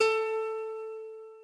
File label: A Guitar